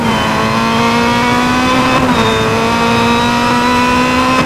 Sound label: third, vehicles cars; caterham r500; lwcars